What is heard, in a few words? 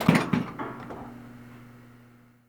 closed, doors; bms objects